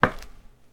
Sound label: Footsteps Concrete Asphalt Street